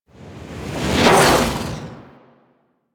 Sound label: Melee Swipe; Warframe; Teralyst; Ropalolyst; Abilities; Melee Grab